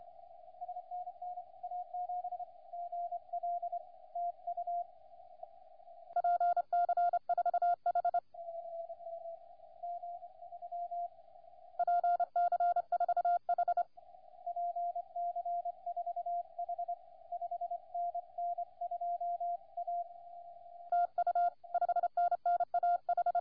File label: CW 21MHz; CQWWCW